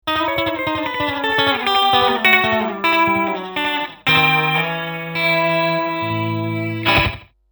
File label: slapback